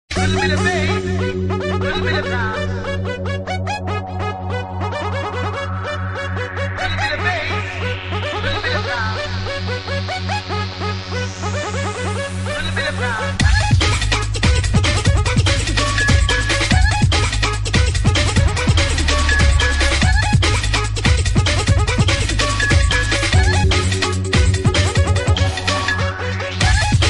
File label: Drum And Bass Version